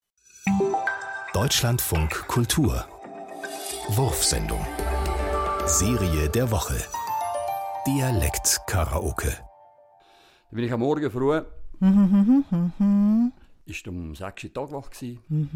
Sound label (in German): dialektkaraoke schweizer deutsch; wurfsendung